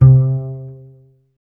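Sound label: string contrabass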